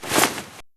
sleeve

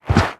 fumble; ahlbi

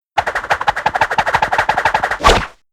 twirl whack

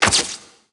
flash

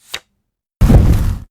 card burn

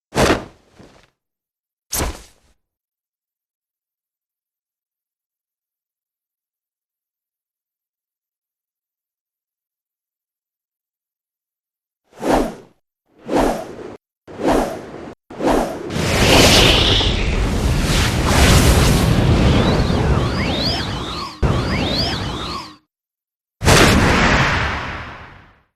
transform; amara

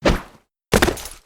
jump; datz

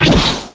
zooma

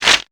newspaper; newsman

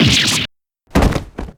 faint

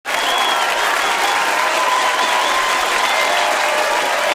gallery cheers

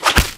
stamp

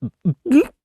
burp; bucky

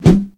fwump

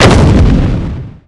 down; olive

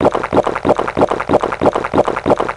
super chug; godot

